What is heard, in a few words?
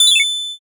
ice magic